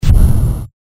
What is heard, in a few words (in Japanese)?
炎魔法 小; モノラル; 魔法効果音